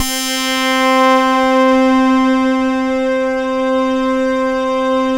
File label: KORG DELTA, LEADS